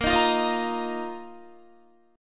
Bb Suspended 4th, Bb, down, Guitar; strummed; 4F,4A,5C,5G,5C,6E

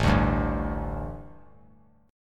A 7th Suspended 4th, A, down; A7sus4 strummed

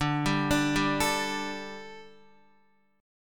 D Suspended 4th D, down, Guitar; arpeggiated; 4F,4A,5C,5G,5C,6E

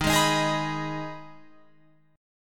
D sharp Suspended 4th Sharp 5th, Guitar, Open F; D#sus4#5; strummed; 15,15,15,15,18,18

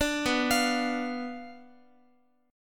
B Diminished B down Guitar; Bdim arpeggiated

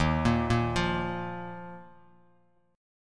Eb Suspended 2nd, Bass Standard; Ebsus2 arpeggiated; JGuitar

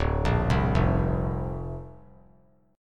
D-Minor 7th Sharp 5th D, chord; Dm7#5; arpeggiated

